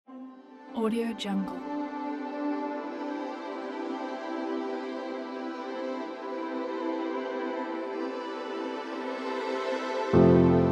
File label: cinematic dramatic violins; serginxs